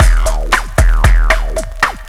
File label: Bossa Back 115 bpm, breaks; Zero-G Beneath the Planet of the Breaks